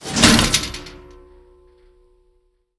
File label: Pressure Plate Up